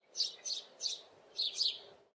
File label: chirp, wildlife; matmos hl